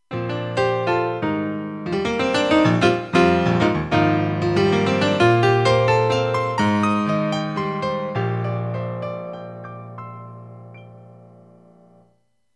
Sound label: Piano